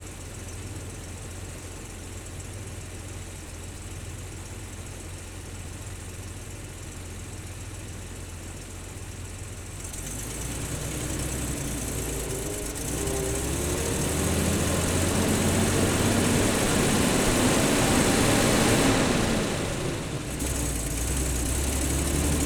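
M8 onboard various driving engine, Greyhound Armored Car; DPA4061; Pole Position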